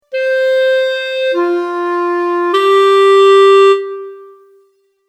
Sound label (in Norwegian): Klarinett